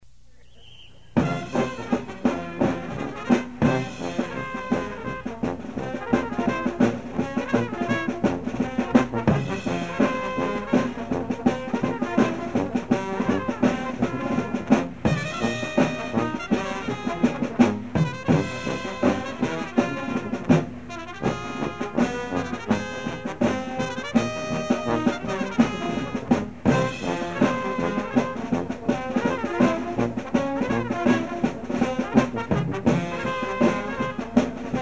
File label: Overture Trombone; The circus performance was recorded by Robert P. Hills, Jr. on August 1, 1973